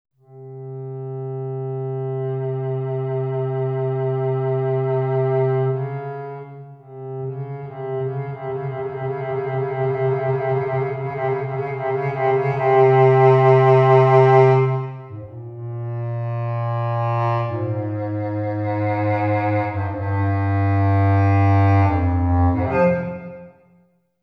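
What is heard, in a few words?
contrabass clarinet; wallander instruments; wivi player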